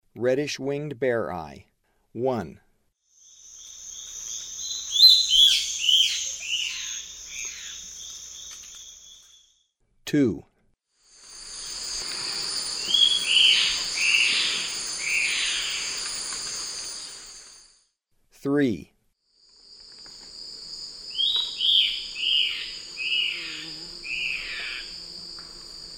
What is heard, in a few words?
Reddish-winged Bare-eye; S. America; Eastern Lowlands